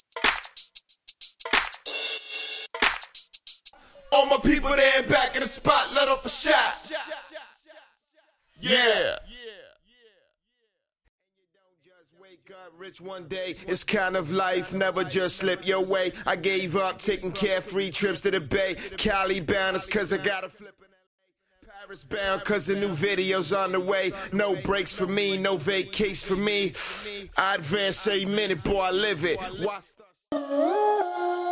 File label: Acapella